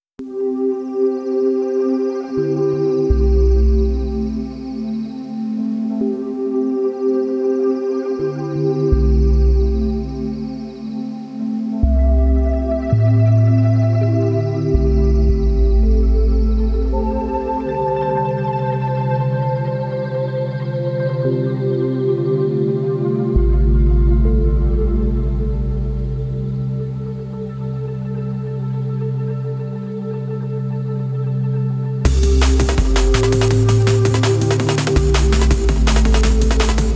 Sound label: ambient jungle sketch, korg gadget